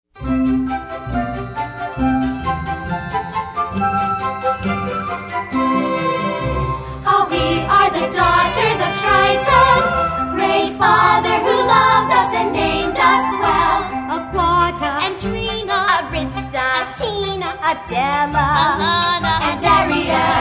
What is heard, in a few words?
vocals